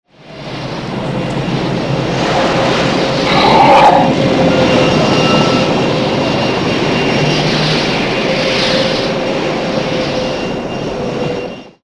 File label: Snort Long Hover; Airwolf